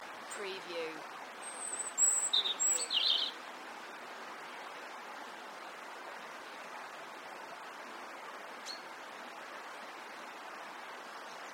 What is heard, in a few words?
River; Ysgethin River Loop; beanstalk audio